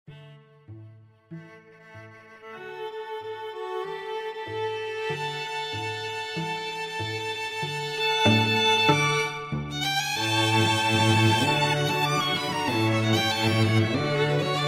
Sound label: Sleigh Bells String Quartet